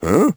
hm; Crypt of the NecroDancer

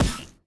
ui toggle; Crypt of the NecroDancer

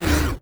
elem ice attack; Crypt of the NecroDancer

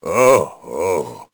confused; Crypt of the NecroDancer

